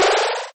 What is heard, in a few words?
Powerup